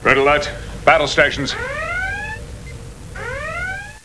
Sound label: RED ALERT